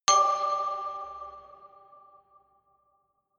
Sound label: notification glockenspiel creepy fifth; instrumente